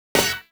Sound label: Ahhhhh!; Mario Party Double Screen; ds wii u